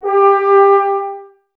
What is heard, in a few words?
BRS TBS G, Woodwind Brass Ensemble, Brass; Miroslav Vitous - Woodwind Brass Ensemble